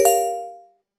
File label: notification